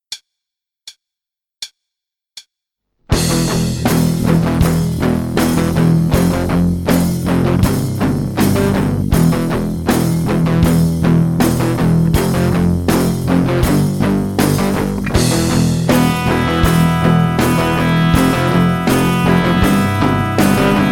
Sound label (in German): New Rock, E-Gitarre; NEW ROCK mit Arpeggios und Mute-Technik